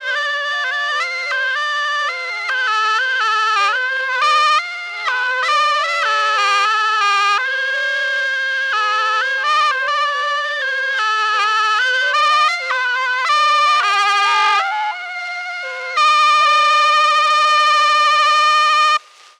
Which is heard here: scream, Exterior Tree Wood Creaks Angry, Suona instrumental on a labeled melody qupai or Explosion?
Suona instrumental on a labeled melody qupai